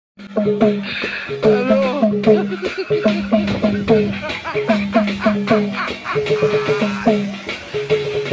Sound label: old women funny dance; audio meme